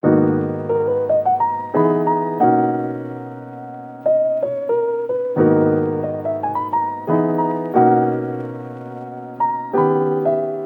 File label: Piano Dry 90 BPM G# Min; Melodics; Cymatics - Eternity Sample Pack